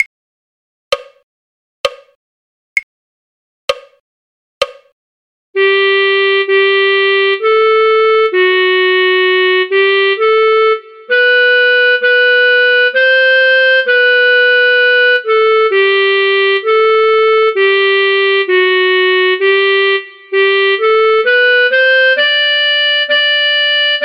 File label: clarinet; god save the king queen; folk